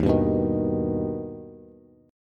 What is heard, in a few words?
Eb Augmented Eb, Bass; strummed; JGuitar